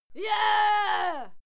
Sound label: female fight scream; schreie; menschen